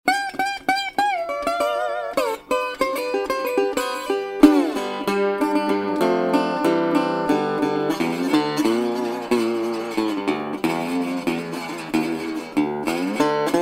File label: Louisiana, Delta Resonator Guitars